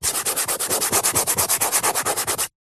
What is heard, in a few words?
chalk rub erase hand finger chalkboard; office